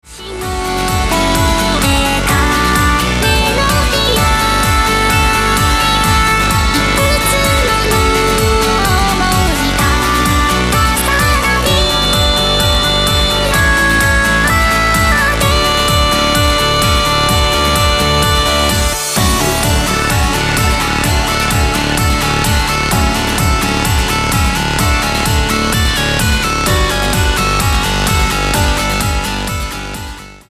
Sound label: melodia